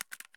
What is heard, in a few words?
saiga round in chamber; Escape from Tarkov